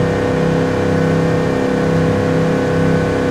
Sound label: TRex Loop, Trucks